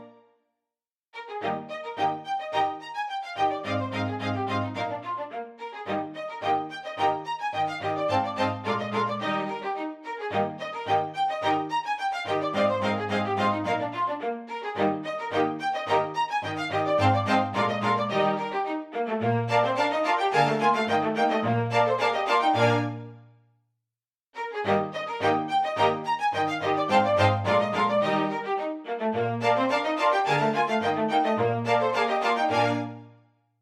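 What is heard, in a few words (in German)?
Contretanze fur zwei Violinen und Bass; Zwolf Contretanze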